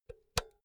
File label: power cord insert hard; objekte; elektronik